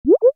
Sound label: you wouldnt believe; notification sounds